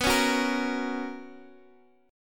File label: B Major 7th Suspended 2nd B